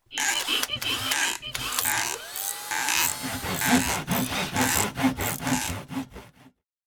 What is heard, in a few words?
Leg Screw W Tools; Irma Vep